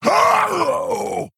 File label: Male Orc Death